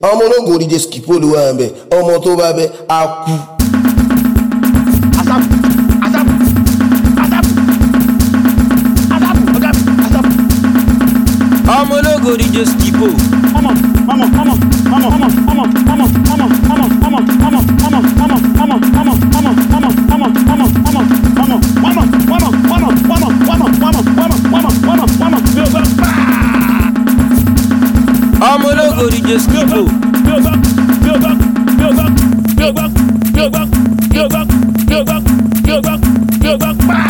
REFIX BEAT

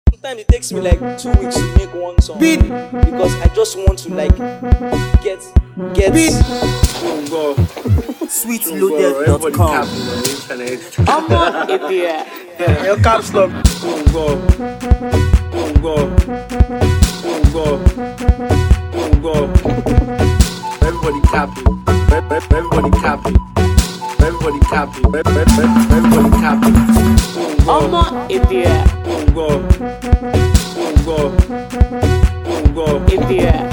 Capping Beat

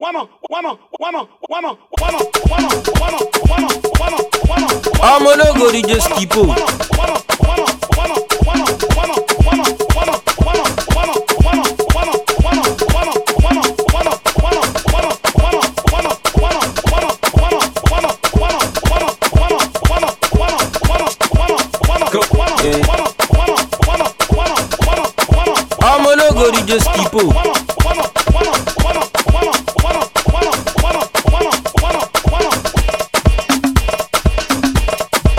DRUM BEAT

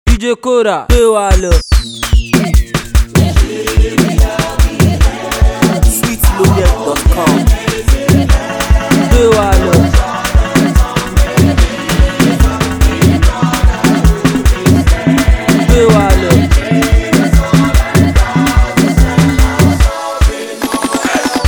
National Anthem

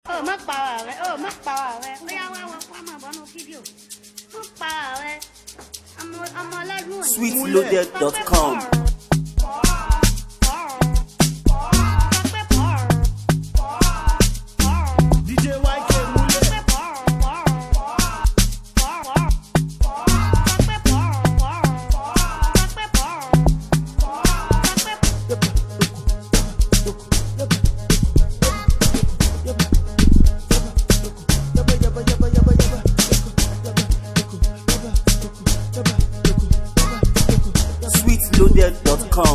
Sope Purr Amapiano Dance Beat

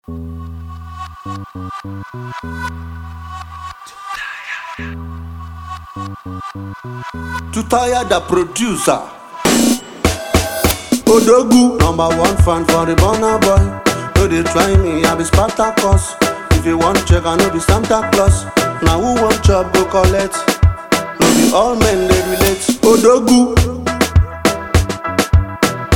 Challenge Beat

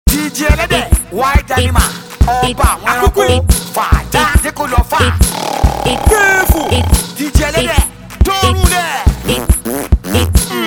Beat

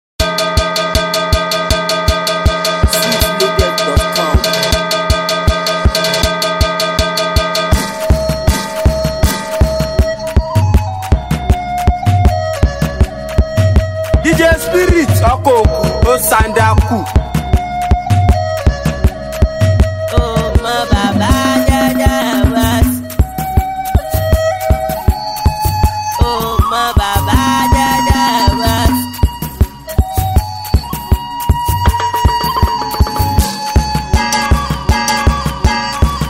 Beat

